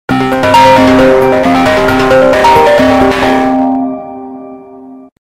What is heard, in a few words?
iphone reflection bass boost